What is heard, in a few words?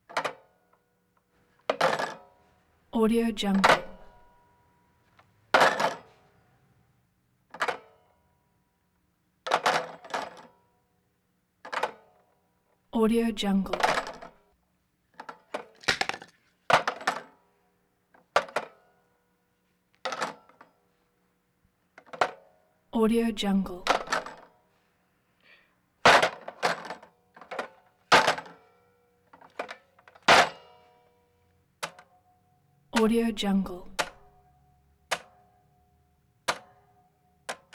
old phone receiver ups and downs button pushing; prosoundfx